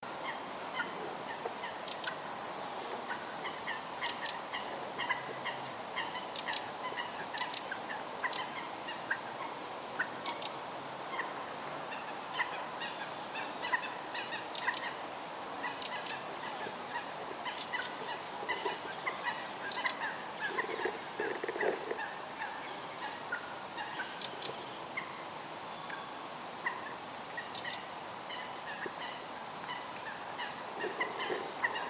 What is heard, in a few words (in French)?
troglodyte, nature automne et hiver